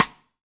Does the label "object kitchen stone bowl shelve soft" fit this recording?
No